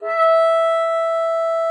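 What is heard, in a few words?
bassoon mezzo E3; Xsample VOL-6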